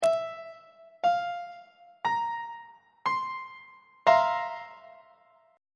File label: e sharp major 7th suspended 4th 3rd inversion on treble clef